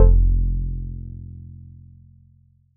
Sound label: bass F1, Casio VZ10M; Polyend; Simulacrum Sounds; 1 Shots; Synths